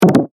Multimedia Percussive, Technology, Multimedia, Percussive, Element; Hit Sound Effects